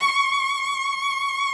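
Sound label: C#6, cello vibrato; Xsample VOL-7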